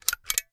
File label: caulk gun pump, Pumping; Caulking gun being loaded and unloaded. Tools, Hand Clicking, Woodworking Carpentry, Pumping